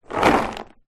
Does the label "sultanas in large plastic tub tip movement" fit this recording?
Yes